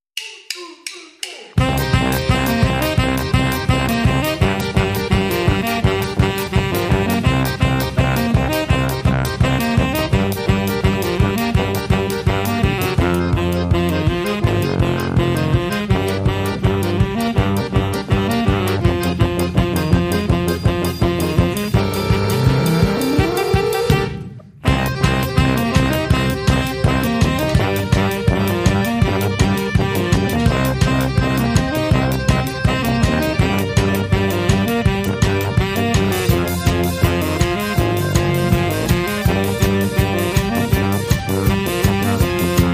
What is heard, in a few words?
Acoustic Techno